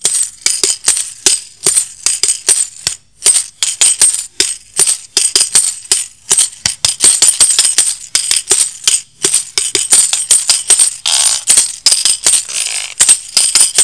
Sênh tiền Việt Nam

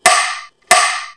Cắc: đánh vào rìa mặt trống âm thanh chắc nịch, Trống Chầu Hát Ả Ðào

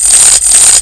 Sênh tiền

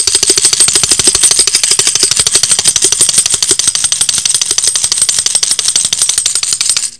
Sênh tiền

